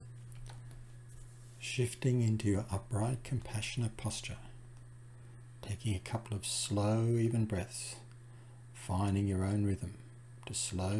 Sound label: Soothing Rhythm Breathing